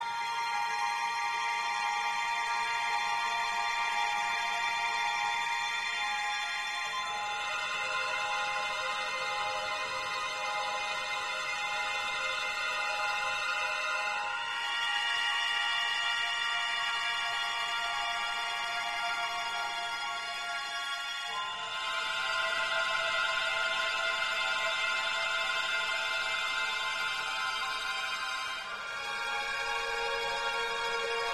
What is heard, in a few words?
loop Tense